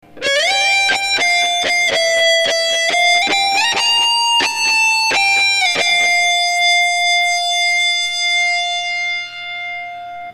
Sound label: outro solo